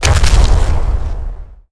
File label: Rock - Hit; Nicktoons Nitro; arcade